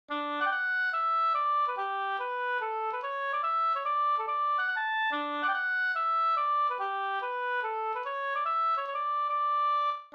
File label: mazurka; Anders-mazurka av Erik Dannberg fi